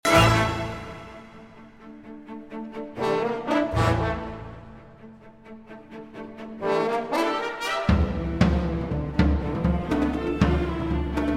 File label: Alto Saxophone, Percussion and Orchestra; Double Concerto